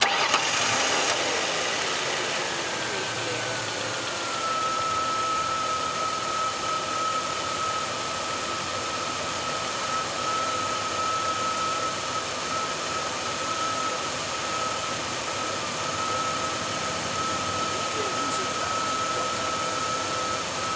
Car Mercury Sable On Idle Off

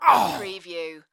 Male Grunt, Human Injury, Pain, Vocal Ugh, Ouch

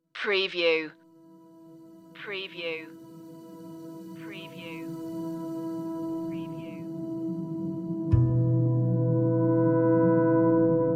island attack

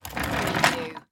Chair Leather Roll

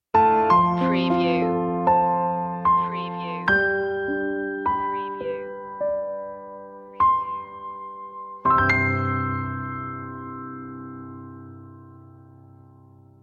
piano; media music now

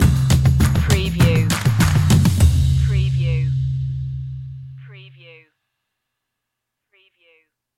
walk together stinger

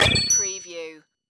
Multimedia Error

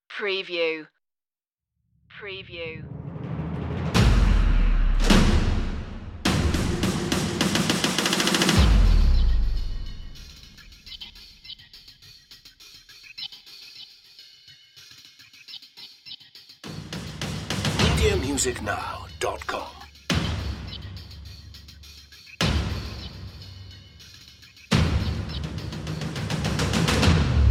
acme energy perc only

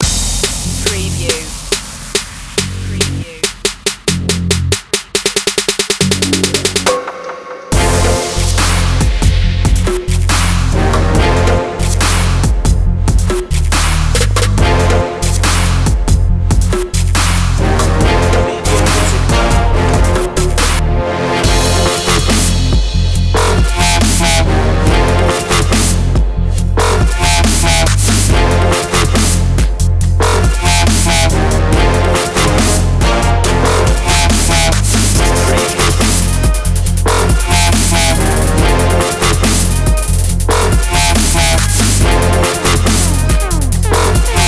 blow the horn no lead synth

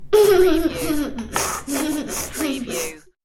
Toddler Giggles

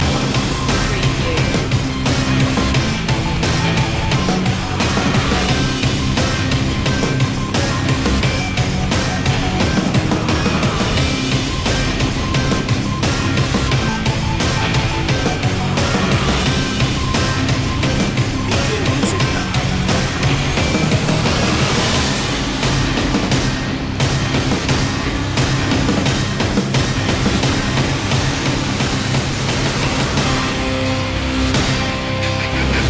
radiation loop

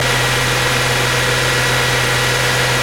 Mustang V6 Loop RPM 4000 Engine Perspective

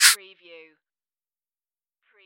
hover

